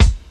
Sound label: Smooth Kick Drum Single Hit B Key, Royality free bass drum sound tuned to the B note.; kick drum samples